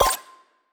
button left; Rizline

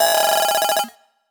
coinroll; Rizline

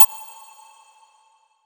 Countdown; Rizline

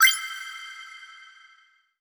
button Ready By Others; Rizline

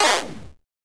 button Purchase Fail; Rizline

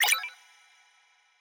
play button pause; Rizline

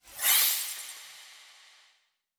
play button terminate; Rizline

